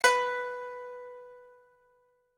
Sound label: pipa